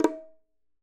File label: bongos Single Hit Flams, Raw Perc One Shots; SEISMIC Drum Sample Pack; Slate